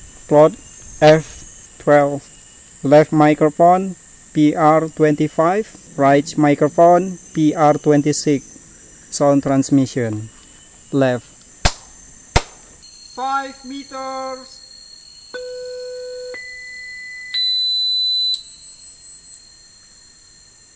birds; SoundEFForTS Landscape assessment

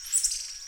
Saguinus nigrifrons

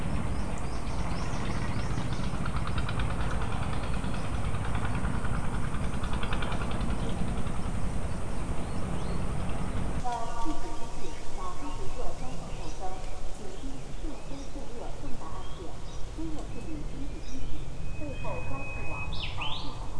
mixture of biophony anthrophony

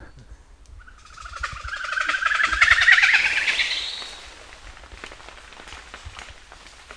Presbytis comata; ecoSound-web

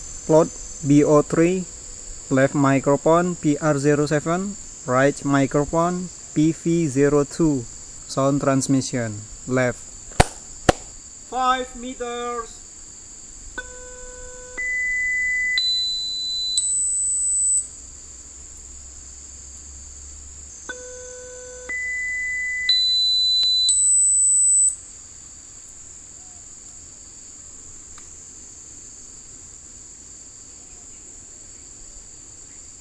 birds

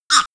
Chuck

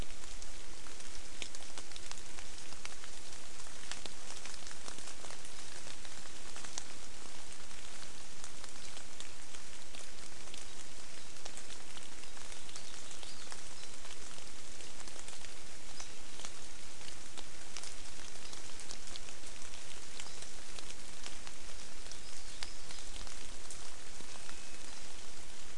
mainly rain

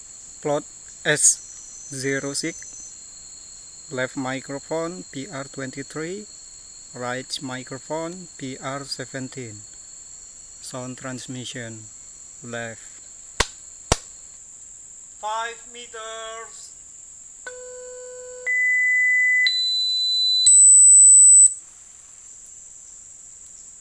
birds

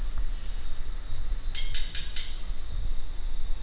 Rhacophorus appendiculatus; Kurixalus appendiculatus

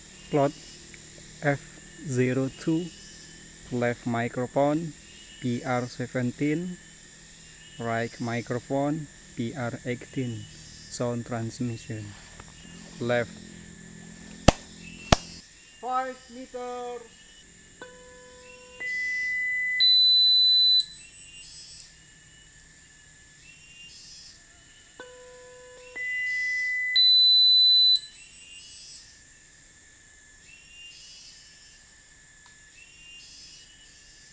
birds

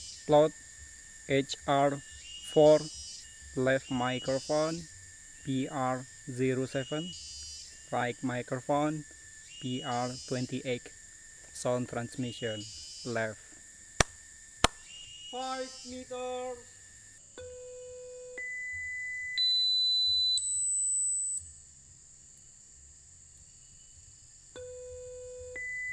birds; ecoSound-web

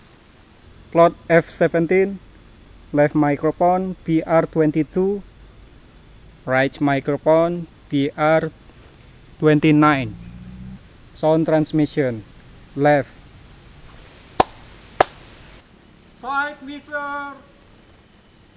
birds

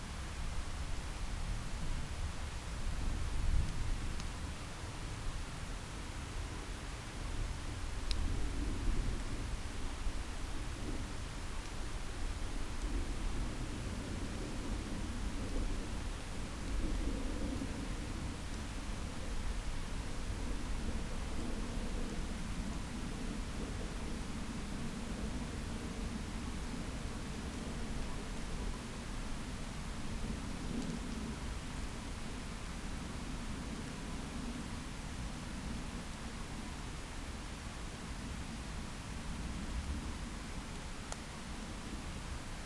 no obvious biophony

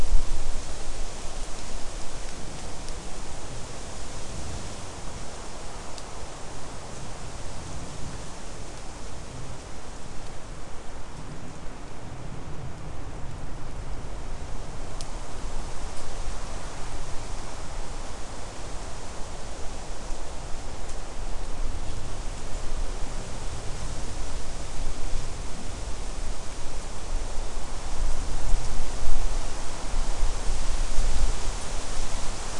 no obvious biophony

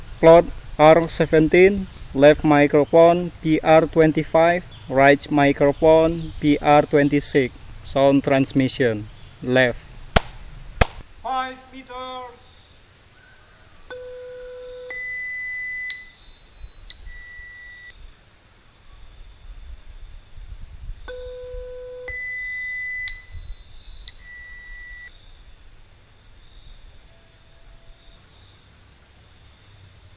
birds; ecoSound-web

